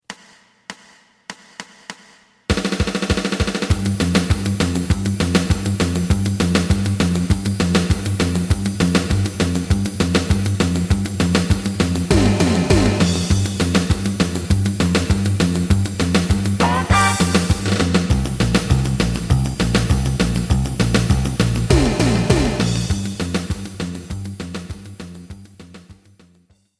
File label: lead